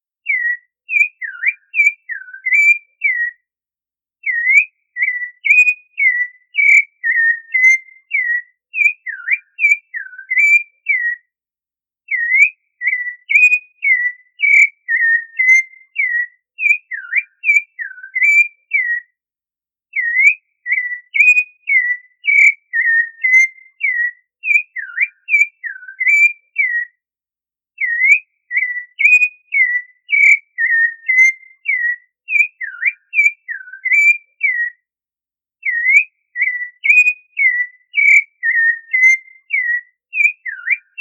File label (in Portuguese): Canto do Sabia laranjeira